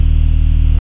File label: electric batmobile engine; stealthy